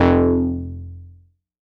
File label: bass F1, Korg MS-20; Polyend; Simulacrum Sounds; 1 Shots; Synths